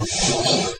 lab door open; gesource; doors

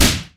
rcp90 fire, weapons; gesource

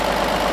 diesel idle, vehicles; gesource

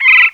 woodpecker, creatures; gesource

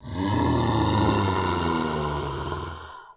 zombie, infected; gesource

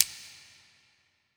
button rollover; gesource

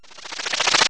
suit charge ok; gesource

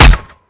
player hit other head; gesource

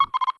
info message; gesource

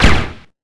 silenced fire, weapons; gesource; d5k

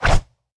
mine throw, weapons; gesource

